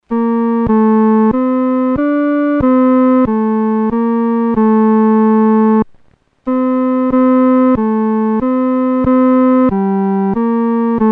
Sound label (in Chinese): 独奏 第三声; Blest Be the Tie That Binds; John Fawcett